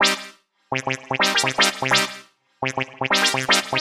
126 C MUSIC; Loopmasters; Funky Underground Vol 2

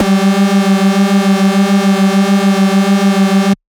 Dirty Dutch Lead G2; Vengeance Electroshock Vol.2; VES2 Dirty Dutch Special; VES2 Dirty Dutch Leads

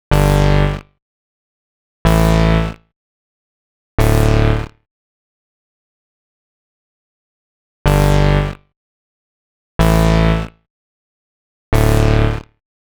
124 Dm Bass, Electro Synths; Prime Loops; Complex Electro Synths; Invaders

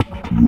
Bb STAB; funky; Loopmasters; Funky Underground Vol 2

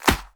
Claps & Snares

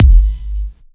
Kick; Dubstep One-Shot Drums

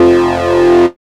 HARD F2, dubstep; Jack Sparrow; Deep Dubstep Progressions

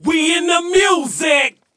VOX

